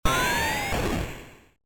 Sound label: Magic Flame; ringtones